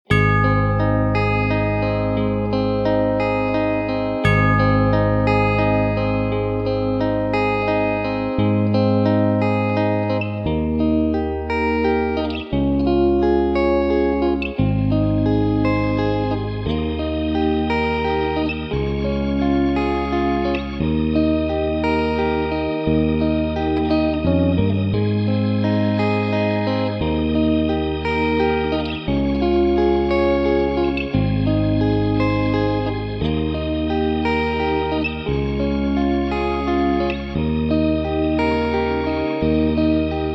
(Ab) No Backing Vocals

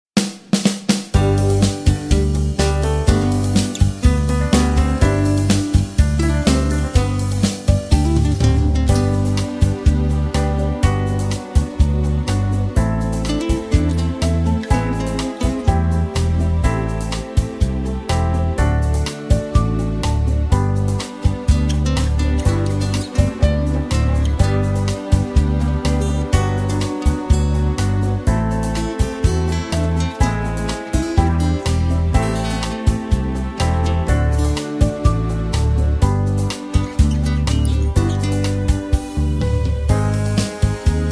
(G To Ab) No Backing Vocals

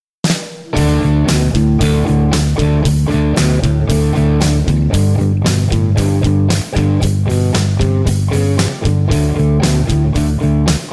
Live D No Backing Vocals, backing tracks

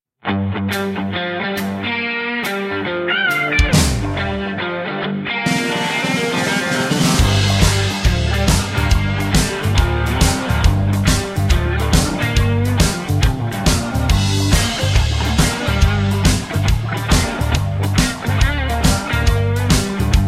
G No Backing Vocals, backing tracks

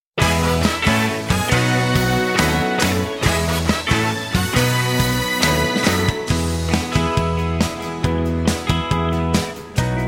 (E To F) No Backing Vocals

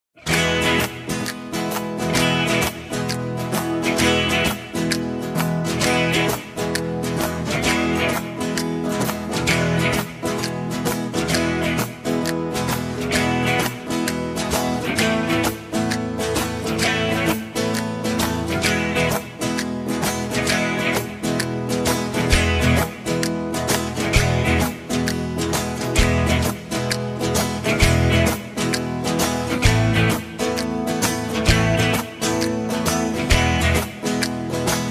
E No Guitar Solo, backing tracks

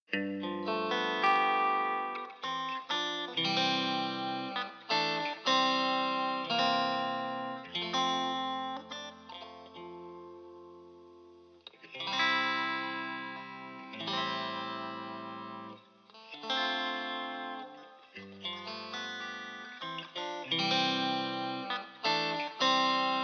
C No Backing Vocals, backing tracks

